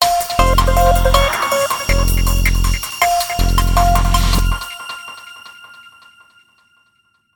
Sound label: Analytic Show Logo